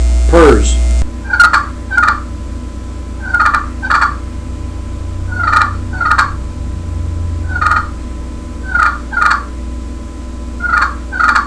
glass purrs, turkey hunting

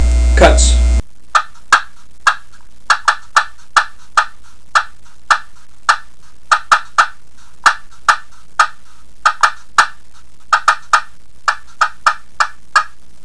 glass cutts, turkey; dixie

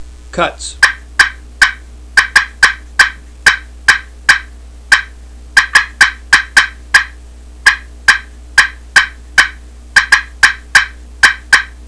cutts, turkey hunting; canecreek

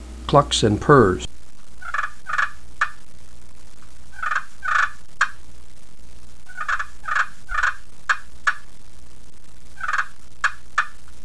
slate clucks purrs, turkey; dixie

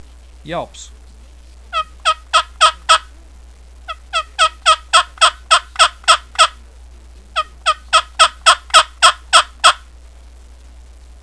yelp, turkey hunting; millennium

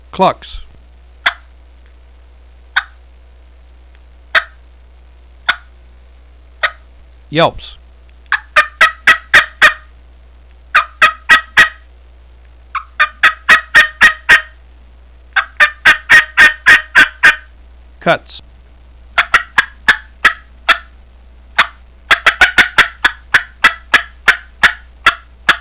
four two all

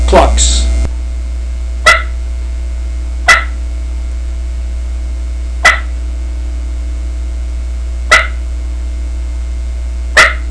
hunters special clucks, turkey; Southland Sounds

